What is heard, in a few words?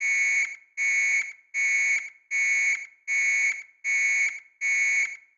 ac130 beeps; Call of Duty Modern Warfare